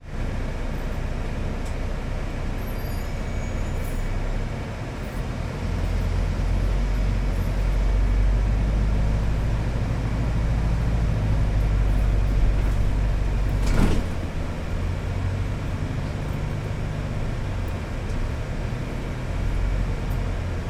transportation bus london city centre onboard upstairs moving